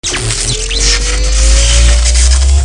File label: electric tower charge up; golgotha